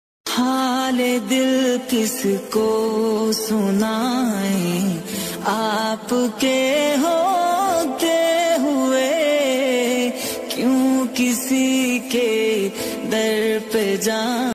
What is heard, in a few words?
islamic ringtones